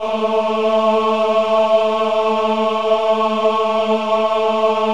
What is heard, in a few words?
VIB A3, VIBRATO, Voices; Spectrasonics